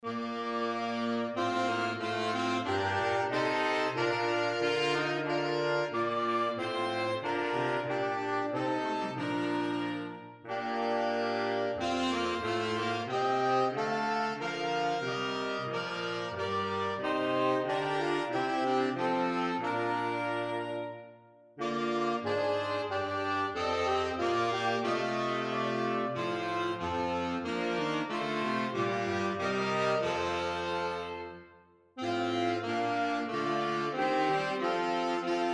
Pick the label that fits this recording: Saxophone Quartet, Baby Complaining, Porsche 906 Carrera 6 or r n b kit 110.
Saxophone Quartet